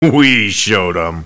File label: LAUGH; BattleSNDS